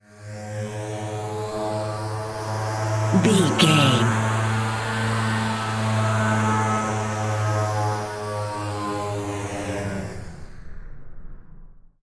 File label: ominous war plane engine stalled heard courtyard